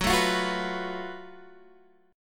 F sharp Minor Major 7th Double Flat 5th, Guitar, Drop D